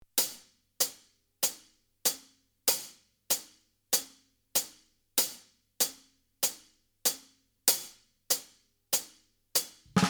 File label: Backing Track